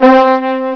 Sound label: Brass; HyperComposer DEMO